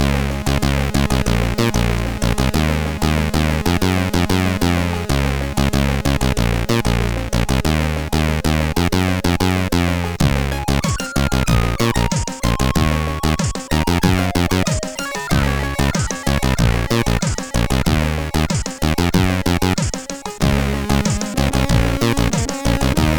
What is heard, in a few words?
Old days, CSG8580R5